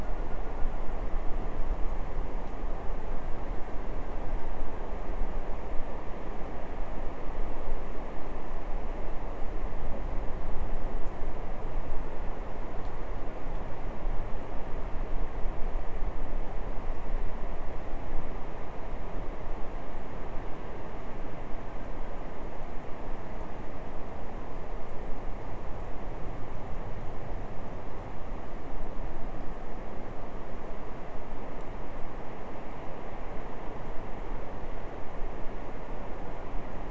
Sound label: no obvious biophony